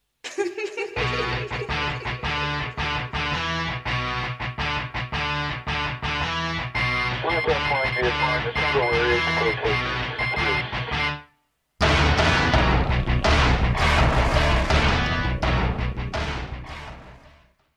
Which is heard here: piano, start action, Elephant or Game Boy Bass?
start action